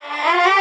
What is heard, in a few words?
vocal spring, Gm; SD AURORA Sample Pack; Slate